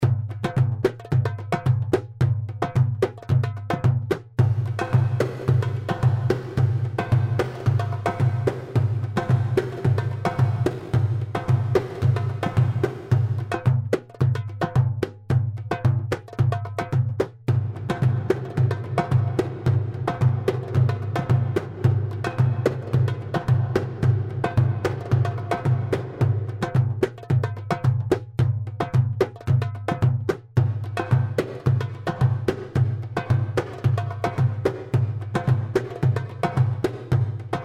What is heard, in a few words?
perc